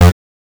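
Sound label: Saw Lead Low F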